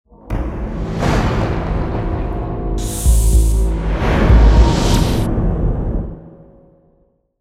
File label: cinematic construction